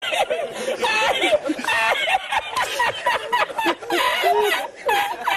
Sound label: funny laugh